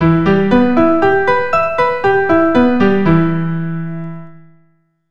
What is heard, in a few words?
Em Arp, keyboard